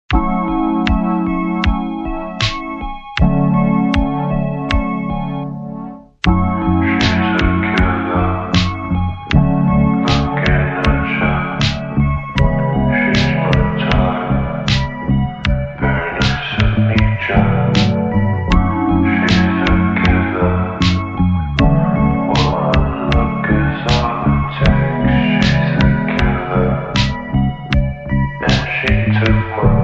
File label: slowed